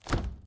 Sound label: Morgue door open; Phasmophobia